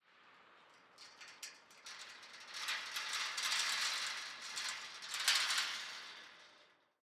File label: Shaking On Steel Bars